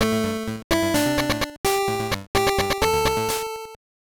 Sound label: Jingle; Daisenryaku; GB